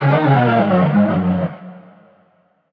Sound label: vocal organ G#m; SD AURORA Sample Pack; Slate